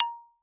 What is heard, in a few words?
Xylophone rosewood ff Bb5 stereo; MIS Pitches - 2014; Percussion